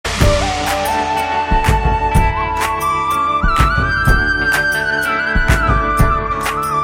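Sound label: Love background music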